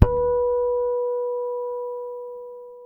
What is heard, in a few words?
HARMONIC B, PEDULLA BASS; Masterbits CLIMAX 7 - Bass